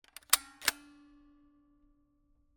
Camera, Kodak EK2 Instant, polaroid, large, plastic, springs, snapshot; Eiravaein Works; Vaeyan IV